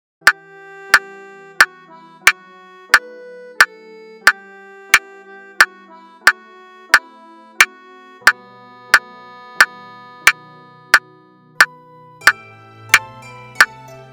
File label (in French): METRONOME 90; orch. métronome